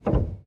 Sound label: piano release